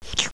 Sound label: KISS; dogz